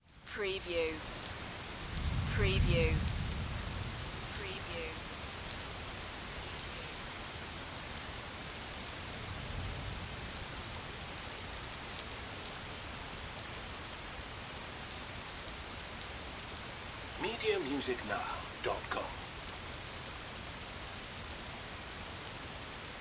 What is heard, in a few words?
Rain Pour Splatter